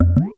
bottle; SFX bottletams

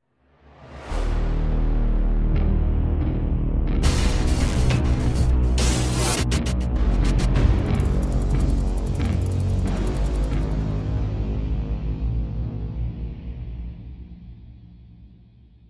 Underwater Game-Over; Dragon s Hideout; beyond two souls

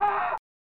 "Ahhh!"; Shadowrun; snes

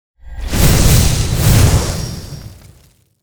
light slash

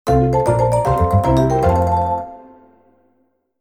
Received a Pokémon Egg!

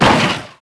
Impact

